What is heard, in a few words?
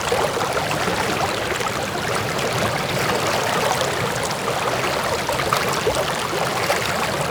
Galleon Water Gentle Loop; Ambience; Warframe; Grineer Galleon